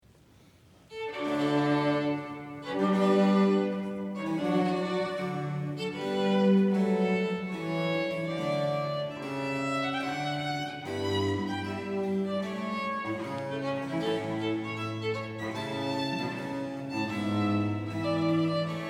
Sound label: Sonata for violin in E flat Major Staccato; David Foulis (1710-1773); Sonata No.1